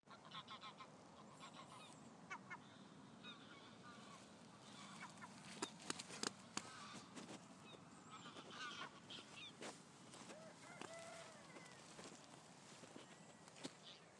flat calm morning

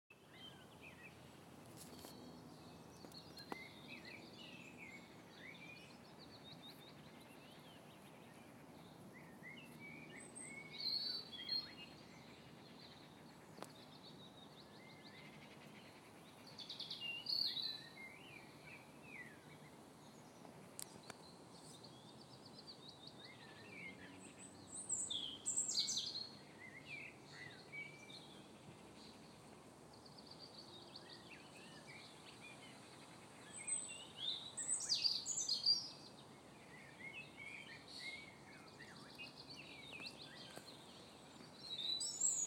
ambient sounds in sutton coldfield

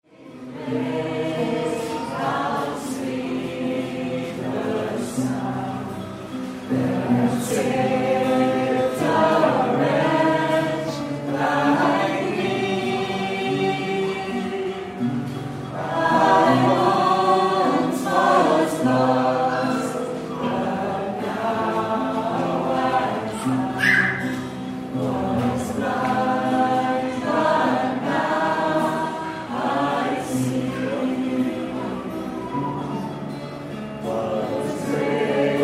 Amazing Grace church chorus wedding

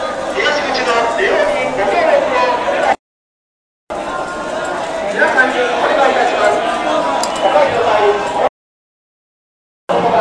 crowd control; at futako tamagawa

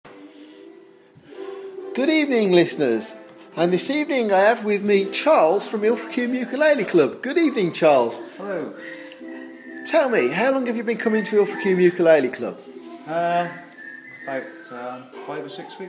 ukulele club; ilfracombe

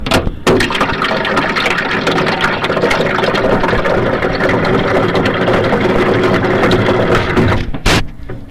water machine w contact microphone

